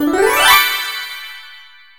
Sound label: spin result